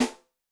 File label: Steel, Snare; Yamaha DTXpress-II